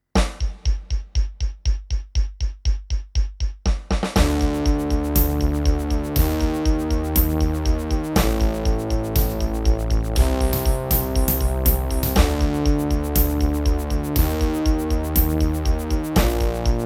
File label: beat mix